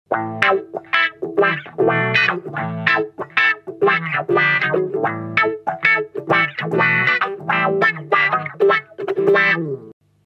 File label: eterna wah wah